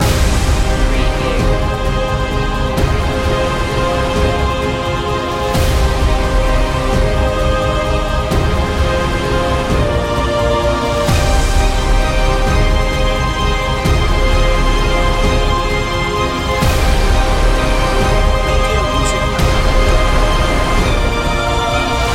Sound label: outbreak loop